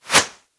Arrow Air; SteamVR; InteractionSystem; Longbow

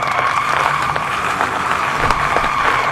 skid Loop; SteamVR; InteractionSystem; BuggyBuddy

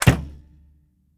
Arrow Release, Longbow; SteamVR; InteractionSystem

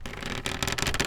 Longbow Pull Back; SteamVR; InteractionSystem

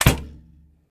Arrow Release; SteamVR; InteractionSystem

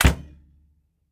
Arrow Release; SteamVR; InteractionSystem; Longbow

